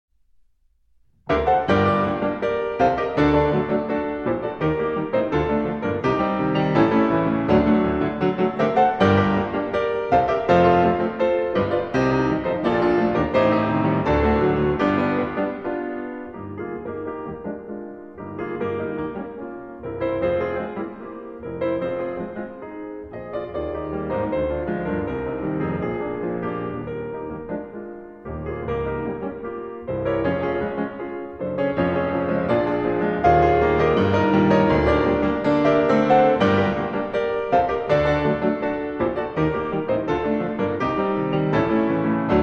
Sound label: Klavierstücke in G Minor, Ballade. Allegro energico; Op. 118